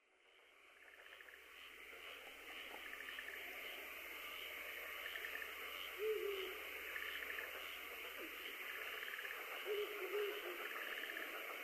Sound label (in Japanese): fukurou